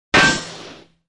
Bullet Bounces Off, metal

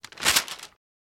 Paper Bag Open; paper mvmt

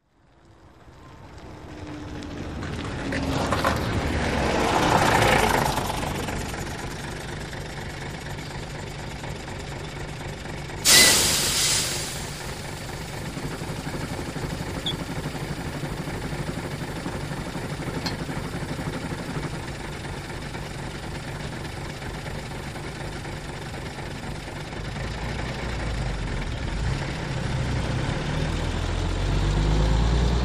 Bus Stop Coach Glide I

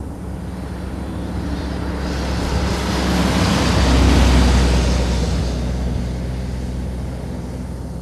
Car By Slow

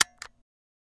Cassette Play Mini, Cassette Player

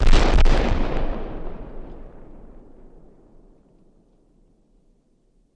Cannon Shot Single, shots

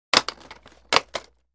Roller Blades Drop; roller blading

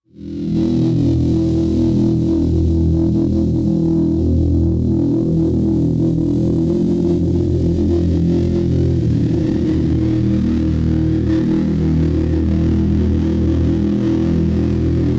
Night Boat Low Electro W, energy beams

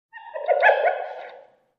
Hyena Unknown Type, hyenas

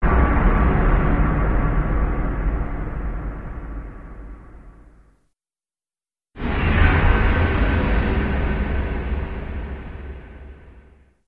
Deep Stingers, whooshes & swishes

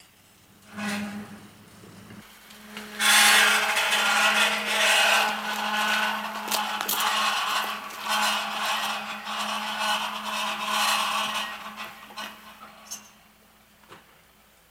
Prison Gate Opens; jail & prison doors

